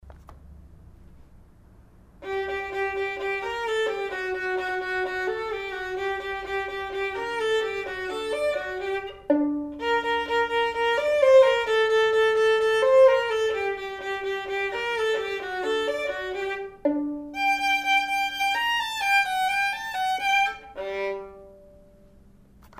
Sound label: perf vln; Building Technique with Beautiful Music, Book 1 Violin